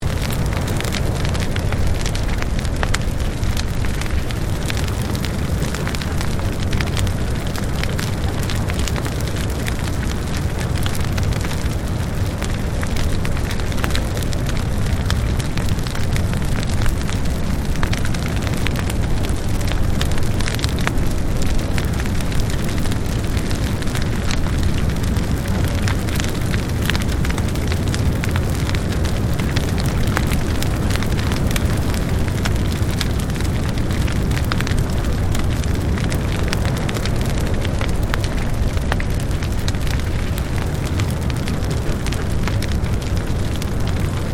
Fire Burning Off Large Established Close Loop, Controlled fire burn; Flames (Sound Effects:Objects)